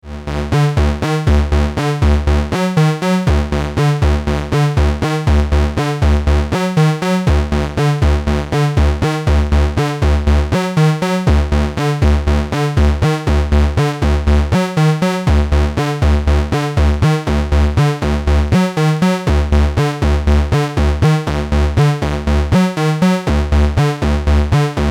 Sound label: Active DI Pre Amp Solid State